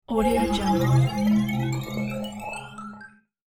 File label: hypnotize spill spell; game sounds; هیپنوتیزم